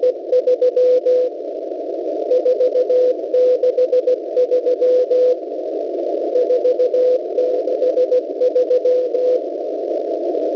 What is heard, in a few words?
cw